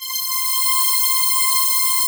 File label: COOL VOX C5, COOL VOICE; AKAI S-Series CD-ROM Sound Library VOL-7